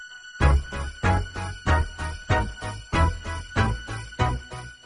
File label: short ring